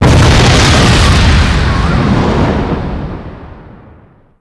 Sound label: cannon explode; alphamoon